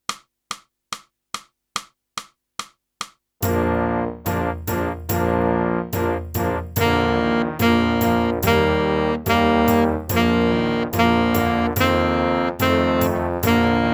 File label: sax